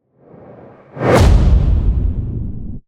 Whoosh Hit; Whooshes; Ghosthack; Mirage

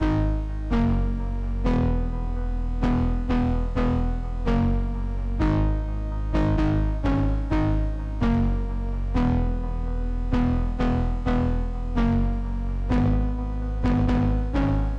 128 BPM G# Min Chords; House; Loop Stems; Cymatics; Breakdown Loops; Jungle

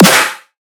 Snare, Dubstep Drum Shots

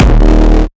kick oneshot monster D#; GhostHack

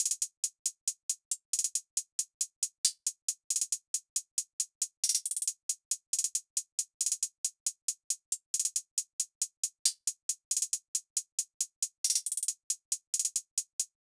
Hihat Loop 137 BPM; Cage Fight

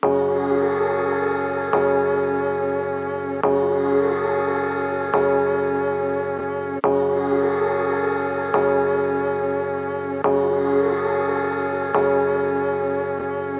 Jump Up - 141 BPM F# Min; Cymatics Gems Vol 7; Gems Free Teaser Pack

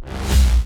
Break Mono Jolt

